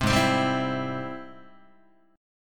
Ab Augmented Major 7th Ab Guitar 4A,4E,5A,5D,5G sharp,6C sharp; strummed; JGuitar